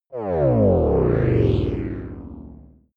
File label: break down; energie